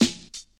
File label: verby snare a sharp key, snare drum tuned to the A# note. Loudest frequency: 1874Hz